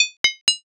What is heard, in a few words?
armor